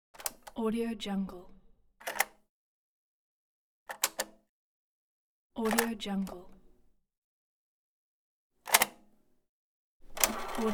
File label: cassette tape player